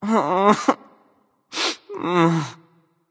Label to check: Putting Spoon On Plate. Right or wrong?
Wrong